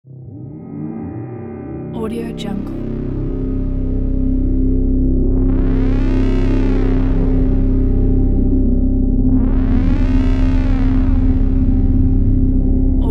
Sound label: deep space drone